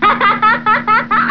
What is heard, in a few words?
Laugh; trixie; anime; speed